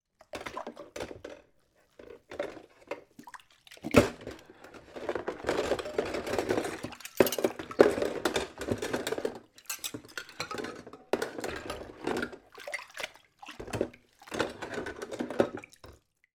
household cups cutlery bowl water movements washing; zapsplat